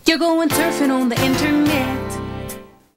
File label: jingle; 90s Internet